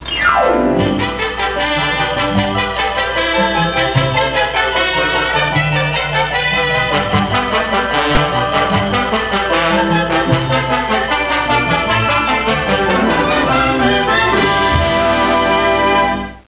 jingle

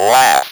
LAUGH, Speak & Spell

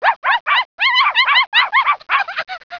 puppbark

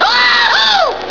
Wahoo!

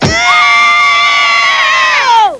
ewwwww!

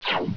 visor ray

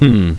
Hmmmm

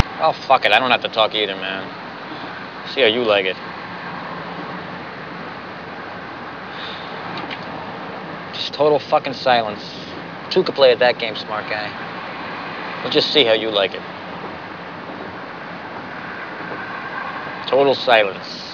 silence

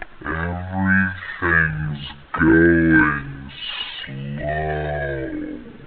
slowww

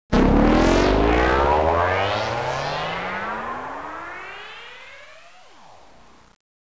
Take Off

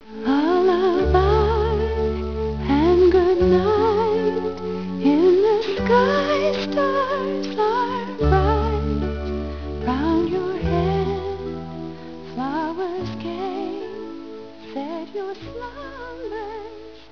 lullaby

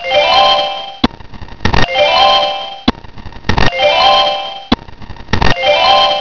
Chime

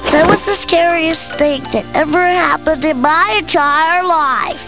scariest

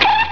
pinch

